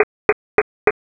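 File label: footsteps slipper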